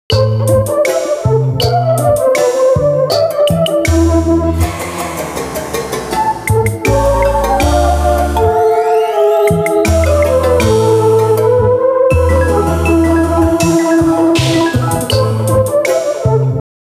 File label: Mystery; Bouken; Taiko no Tatsujin Doka to Oomori Nanadaime; PS2